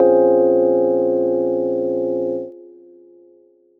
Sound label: Piano Stab